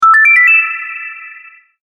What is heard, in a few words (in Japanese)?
完了